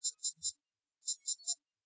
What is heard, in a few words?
Tricorder